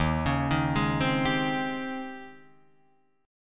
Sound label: D sharp 13th, Guitar, Drop D